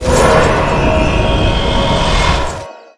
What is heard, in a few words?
summon